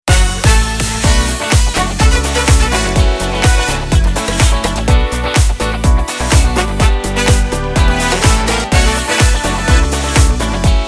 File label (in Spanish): Vocal